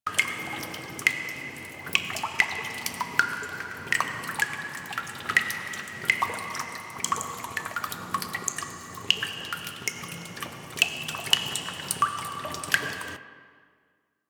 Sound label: Water Dripping Continuous Less Frequent Loop; Warframe; Ambience; Nineteen Ninety Nine